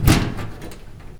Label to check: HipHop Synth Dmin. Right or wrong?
Wrong